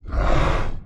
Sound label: Demon Minion Get Hit; TANK; 3Souls